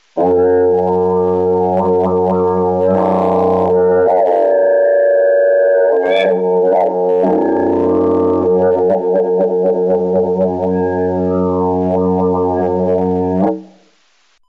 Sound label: F sharp, didges